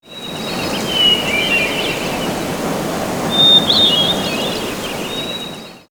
Nature Summertime; X-Static Goldmine

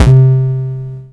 Electron Sidstation, Drum Machines

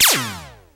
space, hard percs; 90 sSampleCDs; Crypton - DrumBase5000

